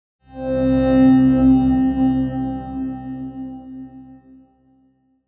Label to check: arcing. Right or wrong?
Wrong